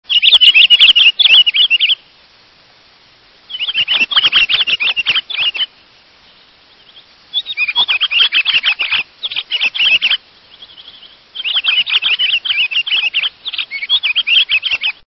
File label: Pine Grosbeak